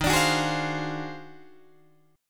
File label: D sharp Minor Major 13th, Guitar, DADGAD; strummed; 13,17,16,13,15,15; chord